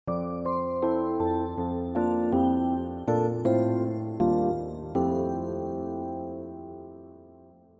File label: Jingles Inn